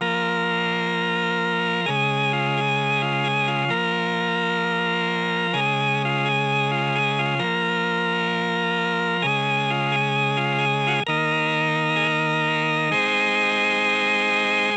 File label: Organ; Synths and Organ